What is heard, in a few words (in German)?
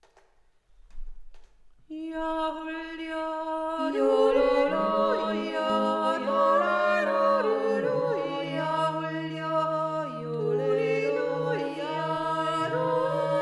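Zwischenteil Jodler, zweistimmig mit Bass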